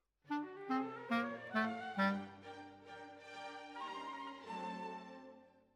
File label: Clarinet